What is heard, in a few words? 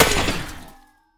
death, copper golem weathered; Minecraft